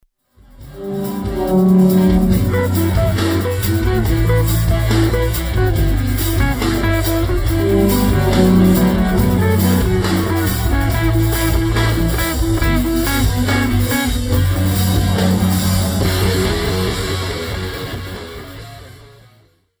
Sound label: bass harmonics; nocturne